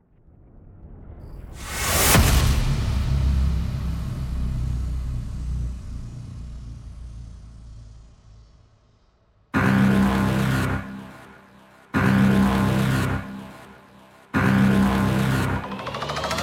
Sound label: punch me 100bpm; Trailertools